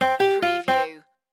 Casino Electronic Sound Game Over

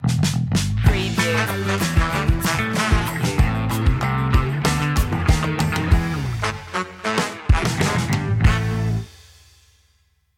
hot funky groove stinger

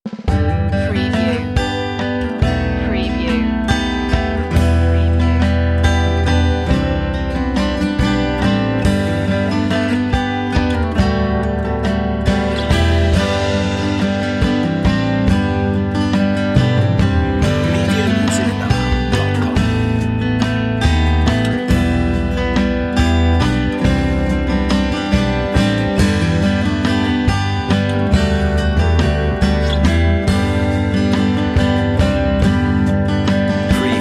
rhodes waltz

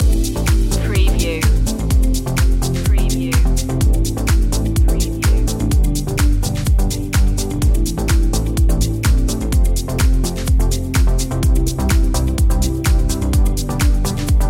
positive direction loop

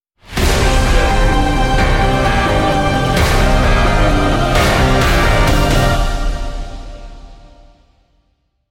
outbreak stinger

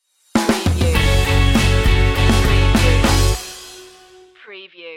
now and forever stinger

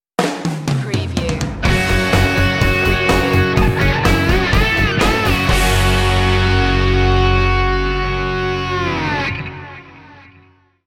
hot wheels sting

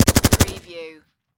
Machine Gun HKG36

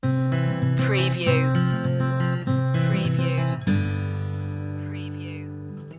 walking together stinger

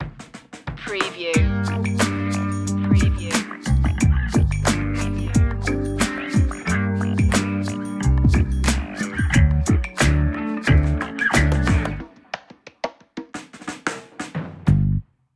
funky sweet relax; 15s

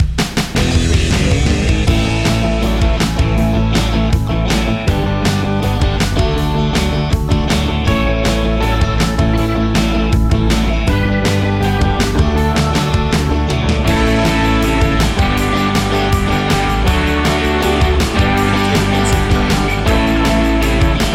moving forward

